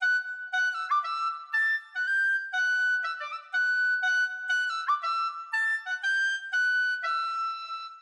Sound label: Recoder